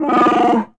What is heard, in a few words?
Notification